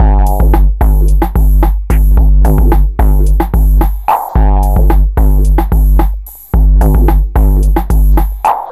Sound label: machine madness 110bpm, downbeat dance grooves